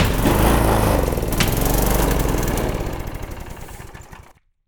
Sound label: object lawnmower break